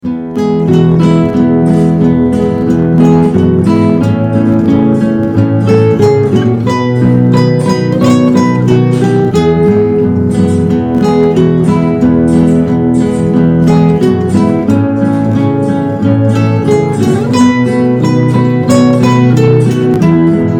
jingle bells one horse open sleigh, orquestra de violões